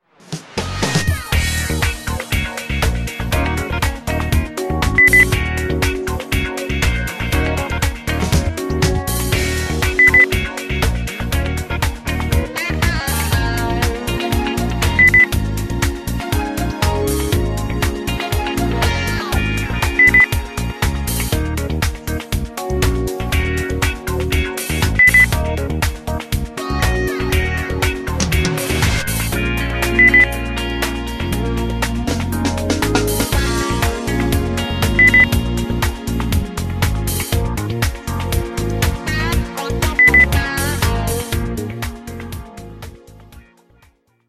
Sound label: groovy